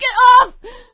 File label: scream while pounced, survivor voice; Left 4 Dead 2; producer